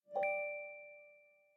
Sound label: reverse charging start; Google Pixel 6